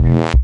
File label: dancehall squirt bass; Basses